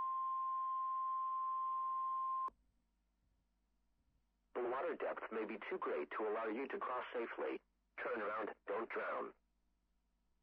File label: beep; Minecraft; liminal-industries